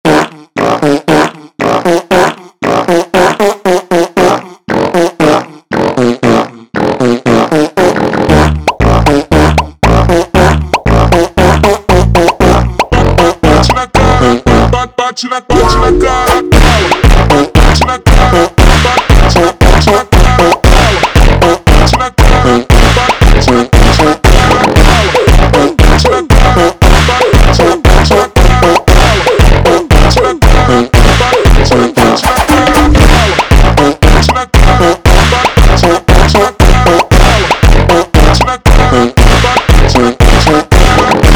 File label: Fart Funk slowed, funk